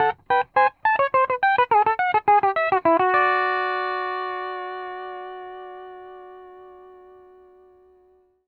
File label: 105 R, PD LOOPS